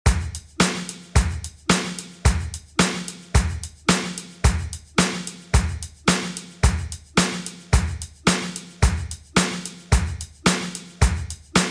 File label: 80s Dj Intro Outro 109Bpm